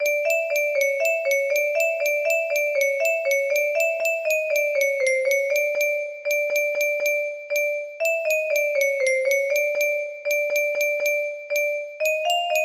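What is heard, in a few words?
random music, music box melody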